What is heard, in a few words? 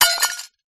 impacts dish ceramic drop light smash